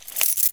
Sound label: Keychain Zip; SFX Kit; Foley - Misc